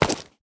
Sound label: move